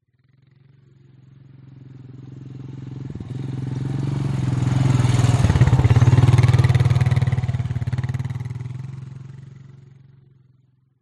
vehicles atv quad bike pass by med; zapsplat

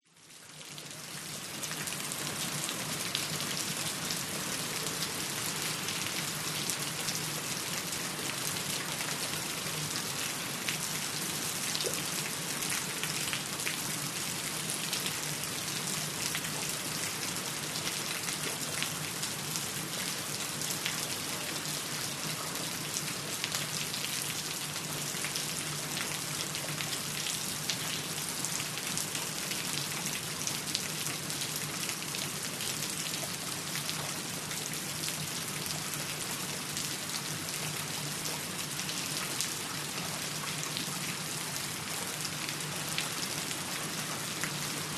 nature rain drips concrete thunder

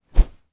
sport cricket bat swing

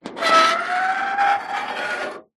foley metal scrape large loud with squeak

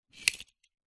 leisure toy cars x2 drive crash into each other; hotwheels

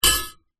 impact metal frame very small

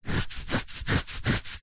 human itch scratch hairy leg

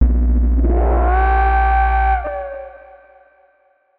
Dark Age Synth; martyn stonehouse

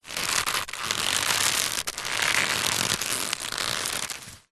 rope thick old tension movement creak stress; foley

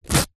foley t shirt thick rip

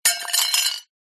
impacts dish ceramic drop light smash

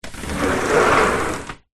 household screen door insect slide

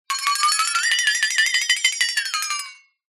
cartoon flexatone wobble shake unsteady tone; zapsplat

